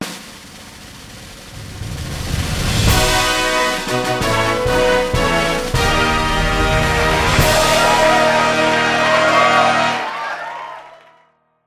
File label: Jingle Award Normal; Champion Jockey: G1 Jockey & Gallop Racer; Xbox 360